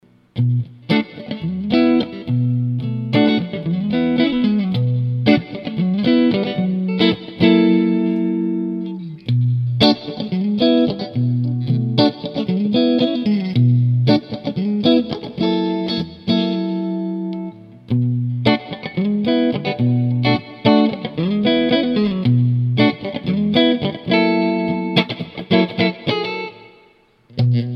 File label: 5 positions OD, guitar